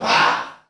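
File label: GROUP VOCAL, percussive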